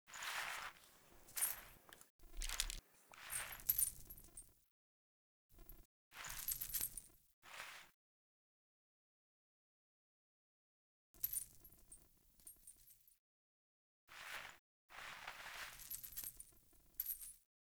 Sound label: Foley; Friendly; Verminia; Bromius Quest